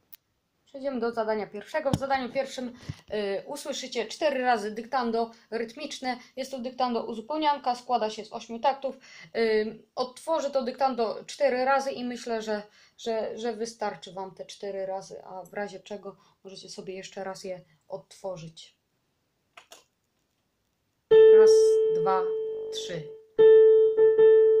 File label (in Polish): DYKTANDO RYTMICZNE; KLASA IV